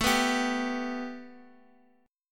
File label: A 7th Suspended 2nd A, chord